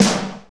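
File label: snare, Fast Tekno, Roland; Northstar - Drumscapes Roland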